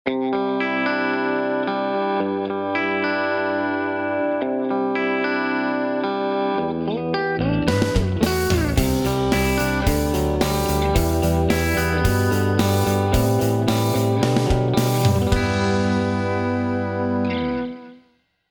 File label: Duesenberg Outlaw, MXR Dyna Comp, Boss DD20, Vox AC30HH2, Celestion G12K100 8 Ohm; The Gig Rig Midi8